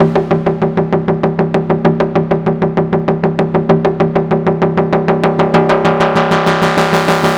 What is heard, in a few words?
Synth Loop 130 C, Techno; Loops; Synths; GhostHack; Ghosthack - Free Techno Sounds 2024